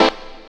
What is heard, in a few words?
JAM SYNTH, KEYS